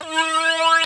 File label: groan tube